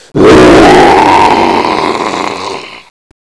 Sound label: rawr